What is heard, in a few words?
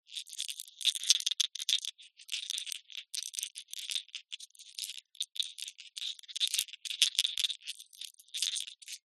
leisure toy ballbearing maze plastic movements